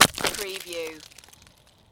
Snow and Ice, Ice impact with ice fragments debris